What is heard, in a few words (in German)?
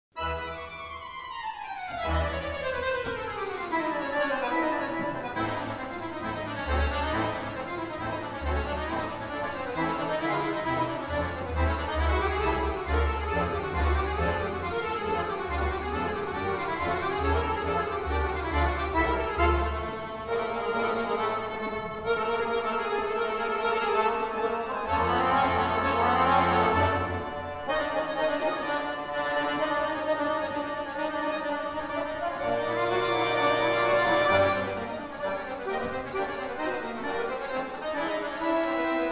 hummelflug, Harmonika